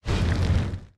asteroid collision oxide small; Factorio

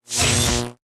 lightning attractor charge; Factorio

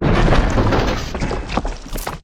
space platform tile build, factorio sound terrain

